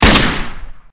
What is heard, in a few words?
colt fire; STALKER